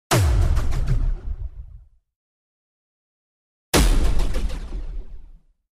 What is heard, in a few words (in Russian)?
energeticheskij vistrel